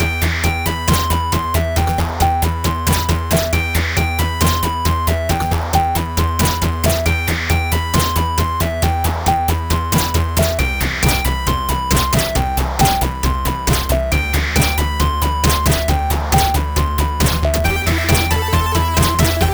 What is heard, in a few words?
loop 136